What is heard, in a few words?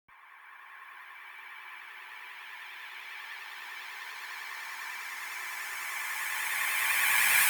128BPM Kit solo